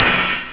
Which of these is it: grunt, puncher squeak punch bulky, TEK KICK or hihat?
hihat